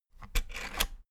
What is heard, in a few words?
rotary control plastic rattle turn